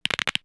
Crack Neck; Civ4 warlords

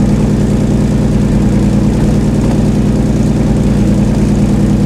cromwell engine rpm load; cannons; War Thunder

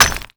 brick; Call of Duty Modern Warfare; physics

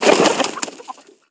animal chicken disturbed

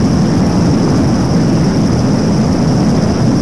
Aircrafts Engines Plane Back Engine; DCS

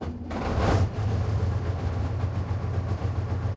start engine, engines; World of Tanks

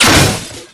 car door rip

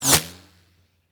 bullet flyby rifle close; Rust

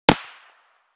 plastic distant; Escape from Tarkov

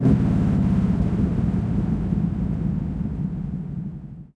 engines; World of Tanks

